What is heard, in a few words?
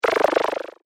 Alien Liquid Blaster; Alien Sound Effects; science fiction sound effect; Andrew Fleming